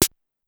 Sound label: Snare, Clavia DDrum-AT, Pitched Up